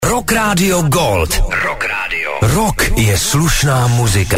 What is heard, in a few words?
rock gold